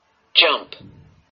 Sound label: jump